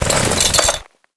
chainsaw draw

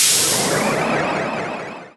teleporter spin fade

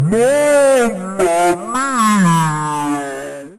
pumpkin scream mama mia end; mission folder that appears when you have taken too long in a ghost house

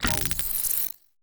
pickup

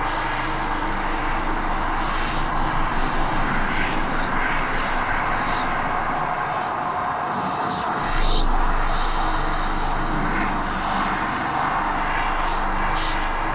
hell tortured loop

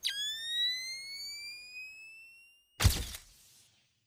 long cam flash

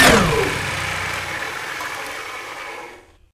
giant heavy gun wind down; brassbeast

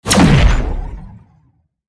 shotgun; voidling

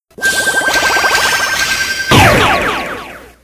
death sound

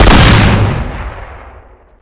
doomstick fire

